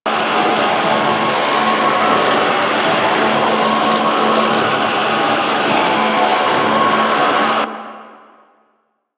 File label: Echo Idle Loop; Warframe; Entrati